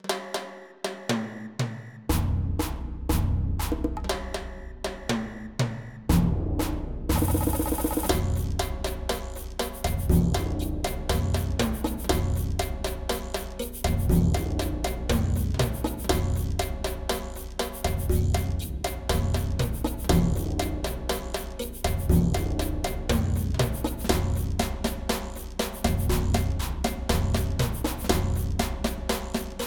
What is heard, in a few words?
Samba